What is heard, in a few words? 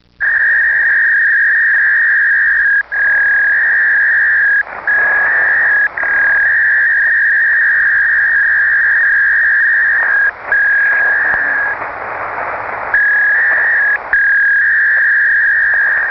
PACKET (AX.25) 300 baud